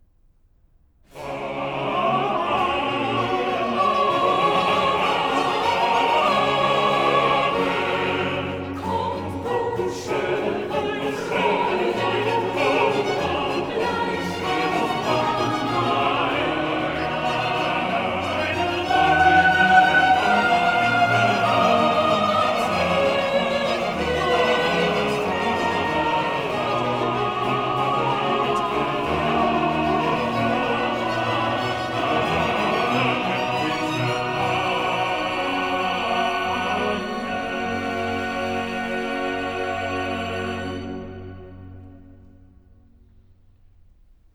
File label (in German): Choral; BWV 61 'Nun komm, der Heiden Heiland I'; VI. Choral 'Amen, Amen!'; Bach; Köln; 1st Sun in Advent; Cantata